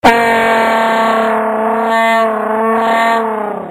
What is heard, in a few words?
Funny Vuvuzela